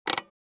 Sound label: Rifle optic adjustment; assault rifle